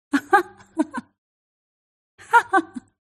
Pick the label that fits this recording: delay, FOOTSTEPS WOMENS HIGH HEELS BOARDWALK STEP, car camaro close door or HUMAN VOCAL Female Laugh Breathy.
HUMAN VOCAL Female Laugh Breathy